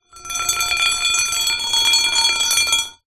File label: metal steel twisted bar small scrape drag hard ground; foley